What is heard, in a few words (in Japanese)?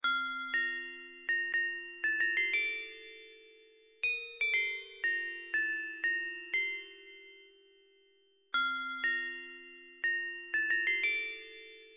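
校歌のメロディー Glocken; 荏田南中学校; 昭和６１年２月１５日 制定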